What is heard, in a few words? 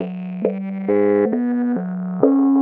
BASE, ELECTRONC